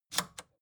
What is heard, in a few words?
record player power click on slow